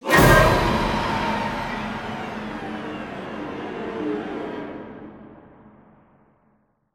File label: Music Stingers - Battle Stinger